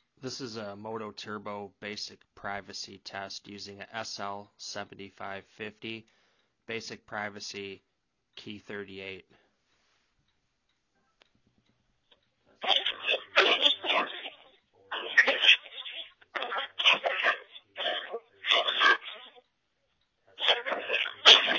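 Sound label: TRBO Basic ENC, radio sounds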